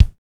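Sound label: kick, Jazz; Northstar - Drumscapes Roland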